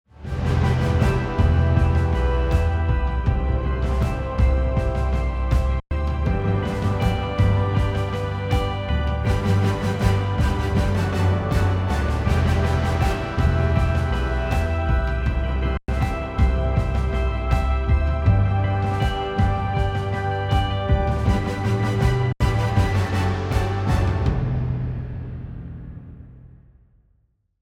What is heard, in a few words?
Orchestral; Gullfoss; switch to 30 Recover 30 Tame 30 Bias